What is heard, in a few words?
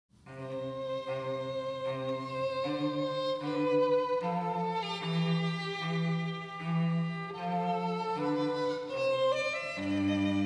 Cello Violin; Largo from Xerxes